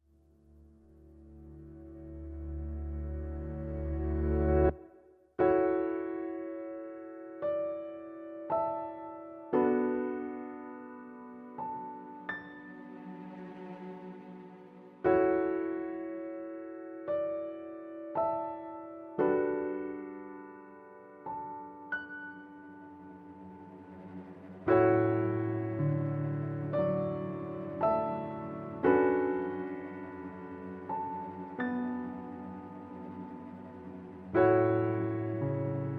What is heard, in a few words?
Instrumental; Pony Music Archive